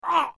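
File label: sounds of human suffering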